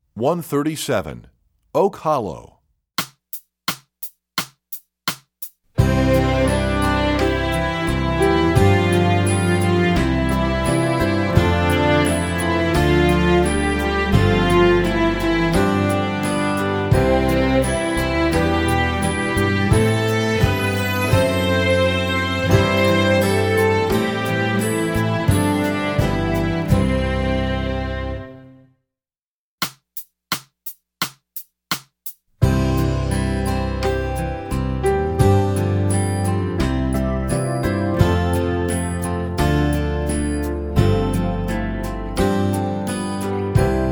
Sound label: Oak Hollow, Strings; EE2000 Book1